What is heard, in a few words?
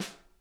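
snare center tap; Sonic Reality; Interactive Drum Kits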